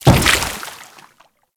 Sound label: Grineer Step Water, Footsteps; Enemies; Warframe